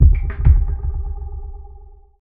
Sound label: drbreak, drumbreaks; Masterbits; Vinyl Attack